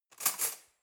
cutlery knife drawer grab hard

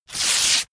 sheet clean tear up fast

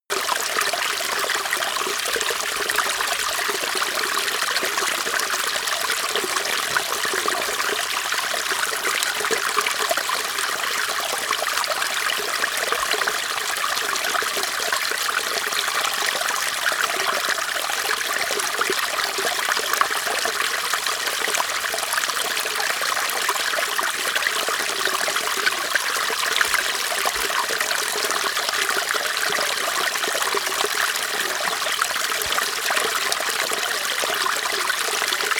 creek small ripple

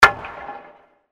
puck hits glass

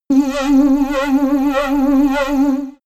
alienation

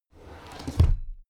drawer cabinet crammed close regular halfway

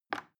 plastic button little release regular

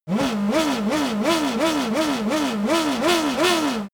racing car f1 engine rev sequence

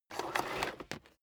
vacuum cable drag out single

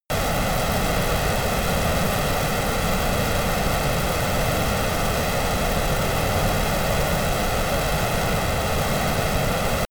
military jet rafale turbine idle close

